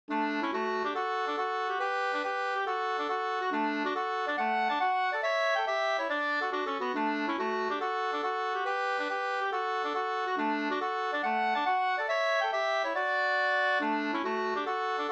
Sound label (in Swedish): schottis; Hinkes schottis Mp 2st